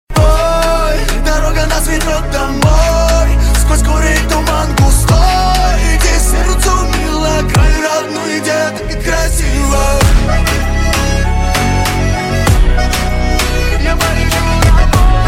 phonk remix

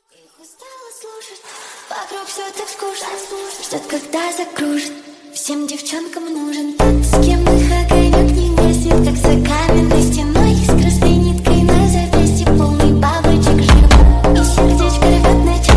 chastushki hardstyle remix

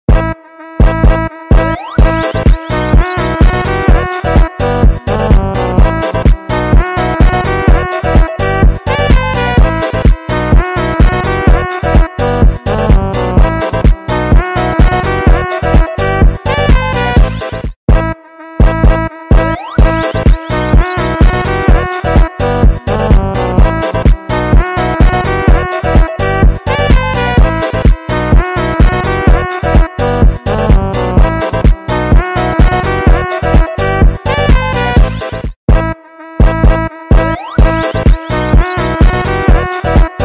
type beat

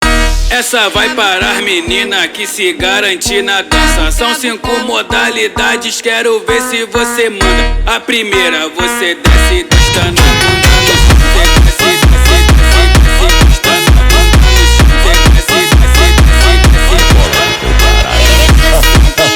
brazilian phonk

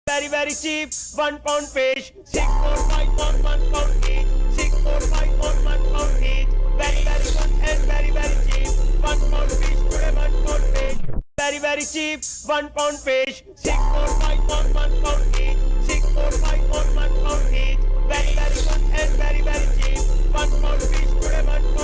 trap remix

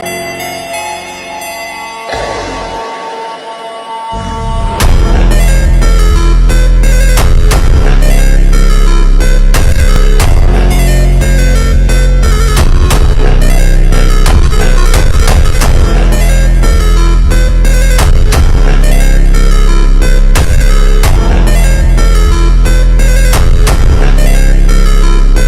broken phonk

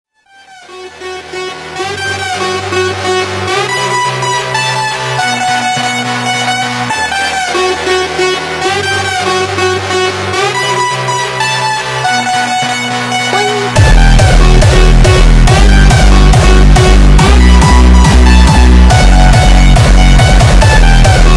phonk

